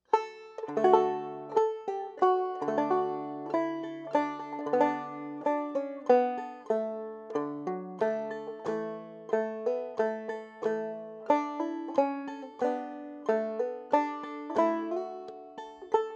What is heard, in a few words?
fast; Christmas